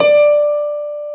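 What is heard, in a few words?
pluck D5, piano; AKAI S-Series CD-ROM Sound Library VOL-2; PF MIX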